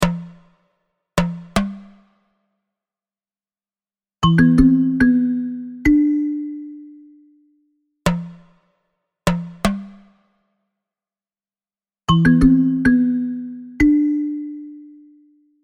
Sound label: cave